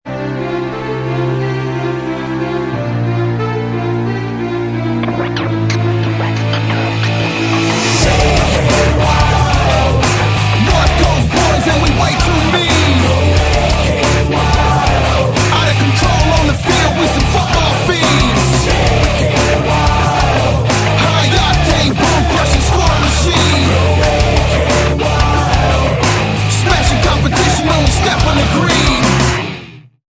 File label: jingle